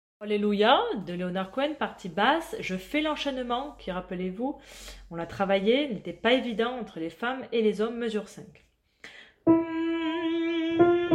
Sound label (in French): partie basse